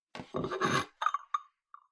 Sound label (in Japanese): ティーカップを取る音